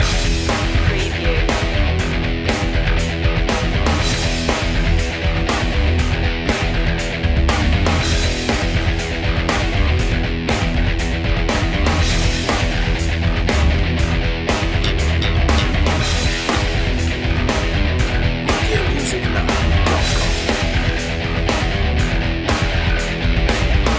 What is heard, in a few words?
intensity loop